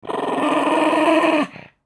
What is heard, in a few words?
mad dog